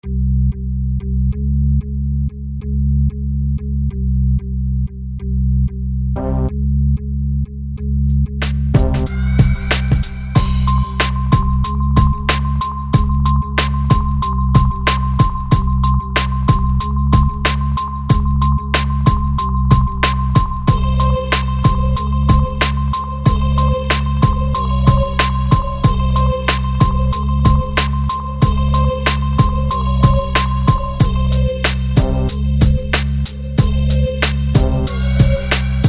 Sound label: beat